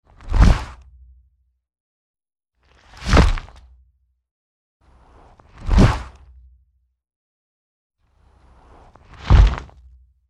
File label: steps norm